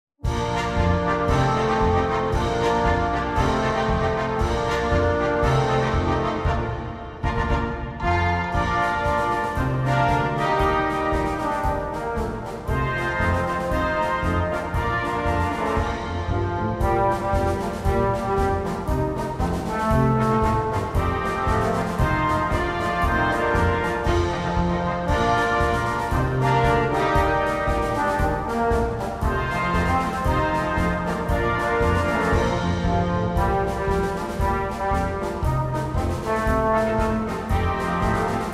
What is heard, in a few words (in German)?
Samba; Rio Samba